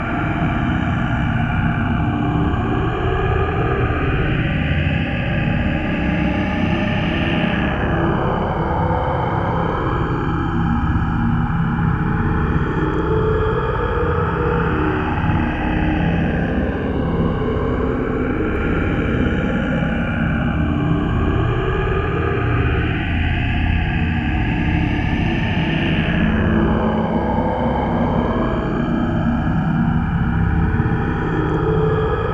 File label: low throat; kurkkulaulu